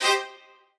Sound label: strings